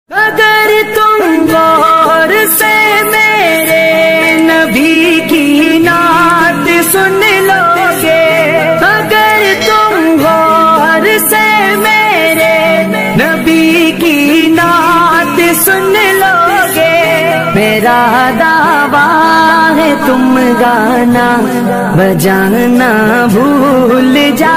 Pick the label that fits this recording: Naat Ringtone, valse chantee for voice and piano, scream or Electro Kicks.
Naat Ringtone